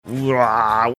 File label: whaaaargh